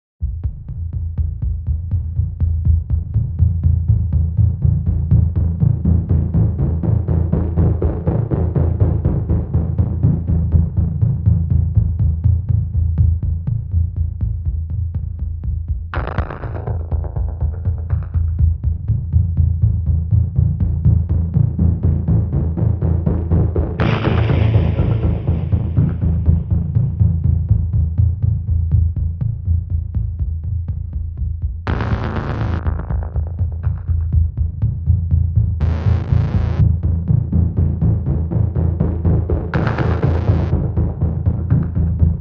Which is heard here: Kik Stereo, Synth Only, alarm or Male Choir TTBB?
Synth Only